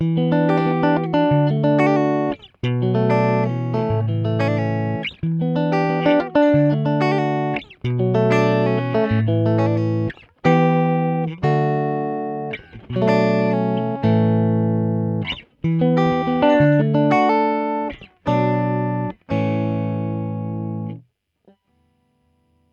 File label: Middle Bridge, guitar; Alex K